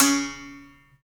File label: STR BS BER, Berimbau; Roland LCDP11 Africa VOL-1